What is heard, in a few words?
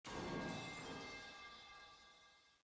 metal pipe far; Minecraft; liminal-industries